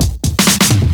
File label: Minimal Fills; Minimal Loops